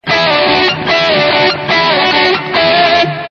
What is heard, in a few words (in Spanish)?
solo de guitarra